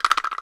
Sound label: kpoko, Kenya Kpoko Kpoko